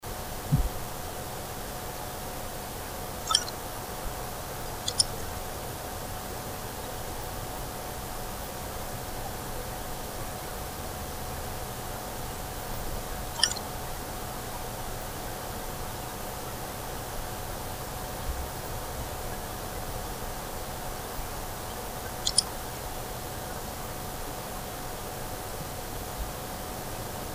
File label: wd3200bevt Reduced Noise Amplified